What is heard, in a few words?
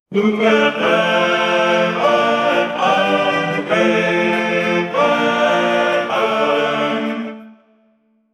a brass section and happy, disco